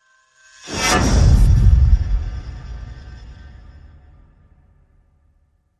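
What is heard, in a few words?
hit horror; Nuisance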